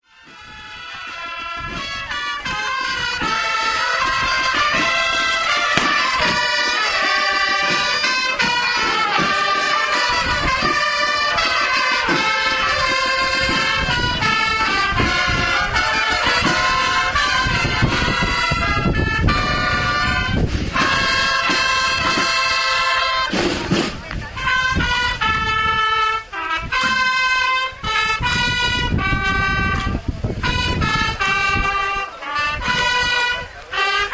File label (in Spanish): procesion; raiguero bajo